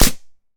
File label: Gun Paintball Shot; Weapons